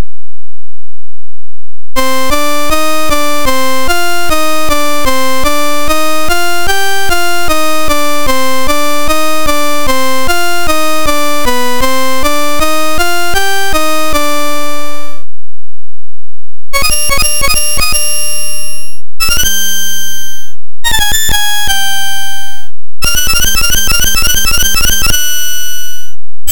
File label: synth